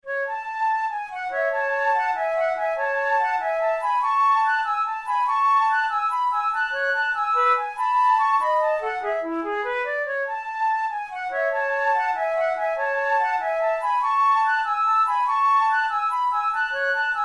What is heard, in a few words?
duet in a major; kohler op93 no2 duet no8